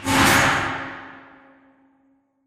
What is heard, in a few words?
Assembly Conveyor Stop Close; Boomtown; Titanfall 2